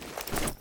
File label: exit, cars